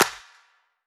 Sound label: Claps